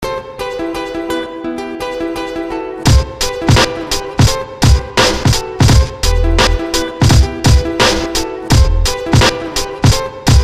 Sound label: mariachi